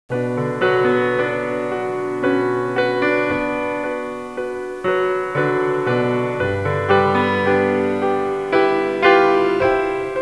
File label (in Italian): suonerie classica; musica classical